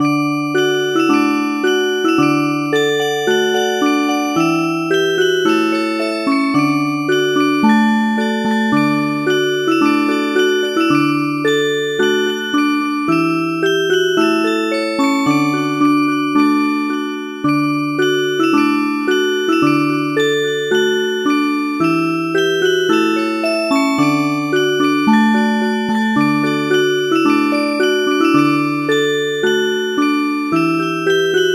Village dance Music Box; traditional; gangar

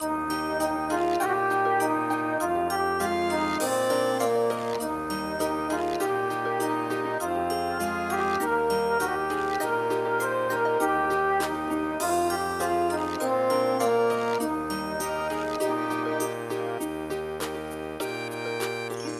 nursery rhyme, midi; dendera

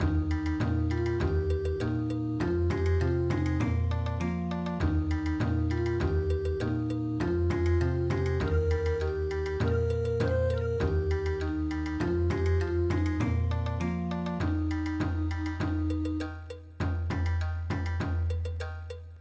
Bon Odori

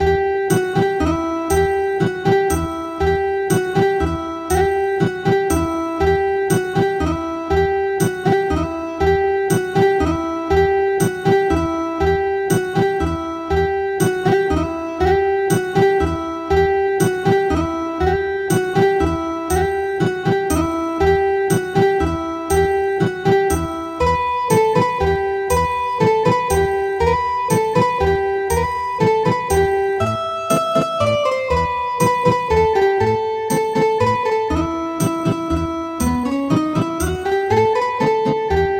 Ethnic Guitar; Carol of the Bells

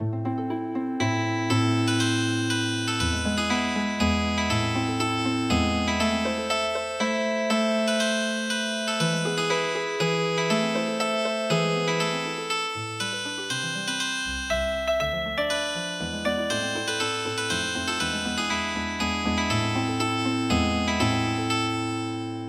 Healing Harp; Her Hjemme

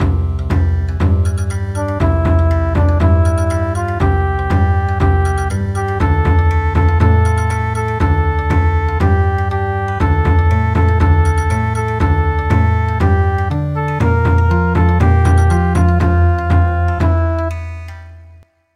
Bon Odori

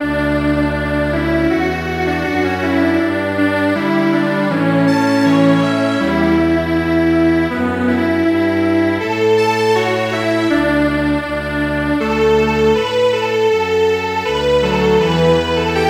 Strings Quartet, traditional; Sleeping aunts

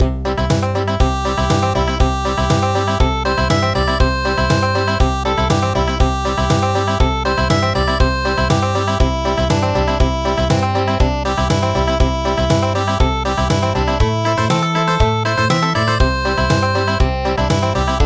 Country; Candle Dance

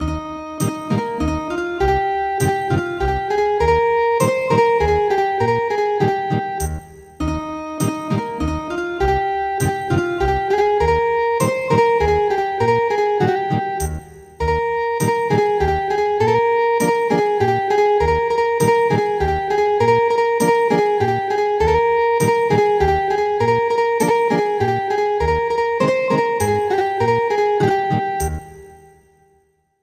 Ethnic Guitar; Heaven and Earth Rejoice Today

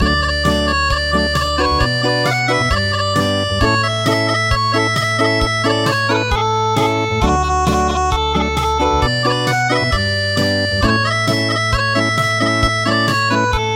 Acoustic

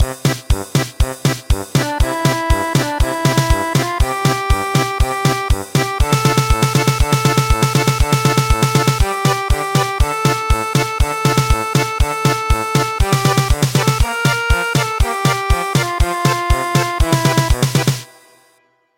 German Polka

